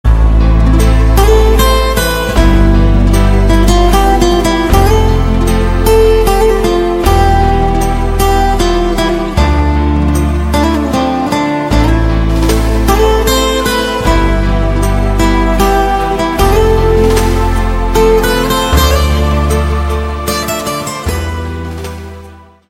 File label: Guitar